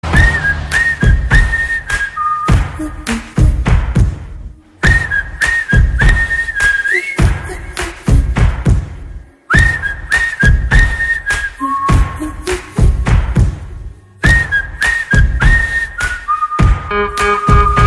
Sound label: Whistle